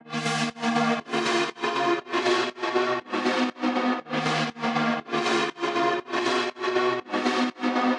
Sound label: Chords; Dancefloor Pop; Remixlive; FreePacks